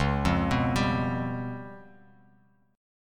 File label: Db 7th Flat 5th down Bass Standard; Db7b5 arpeggiated; JGuitar